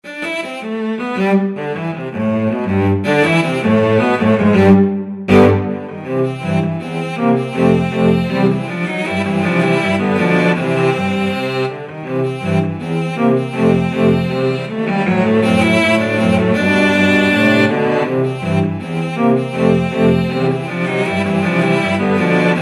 cello quartet

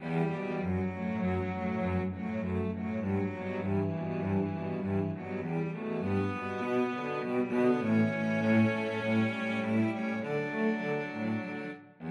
idylle, cello trio; chabrier

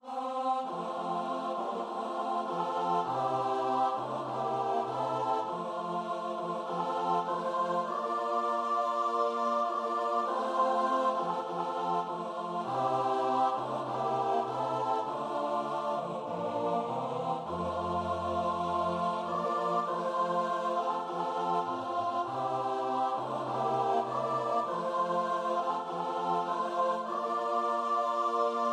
auld lang syne CHOIR

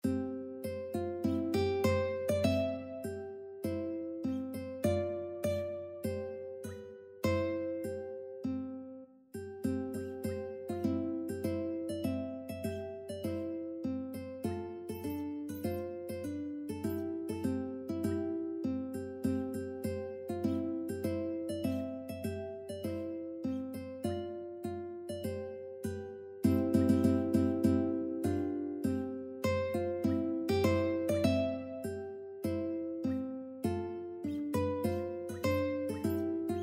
battle hymn uke

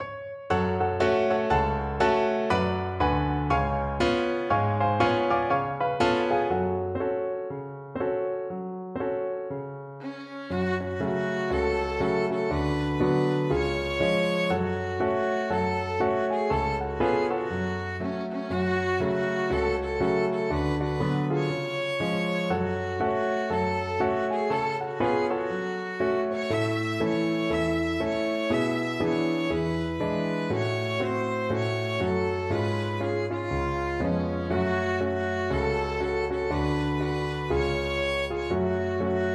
violin

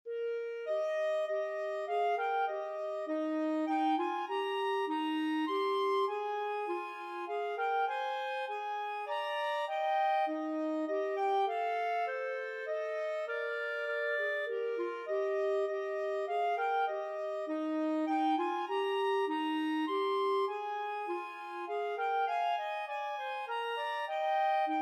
away in a manger 2 clarinet

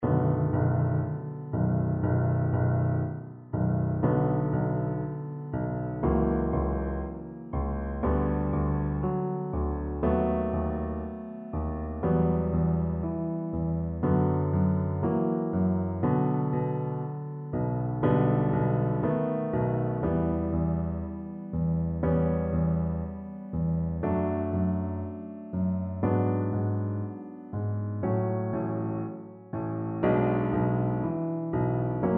Double Bass

